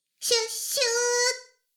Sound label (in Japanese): シコシコ音声; 古き城のドラゴニート